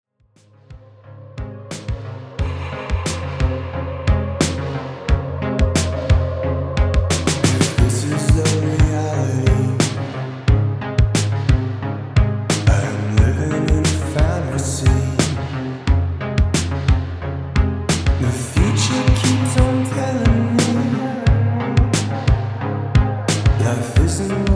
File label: cellar door